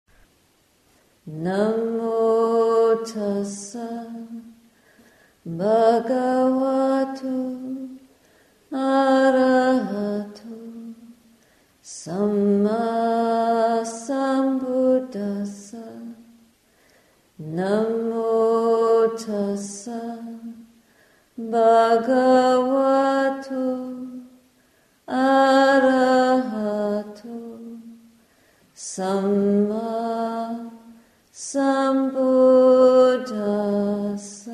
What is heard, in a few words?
namo tassa homage chant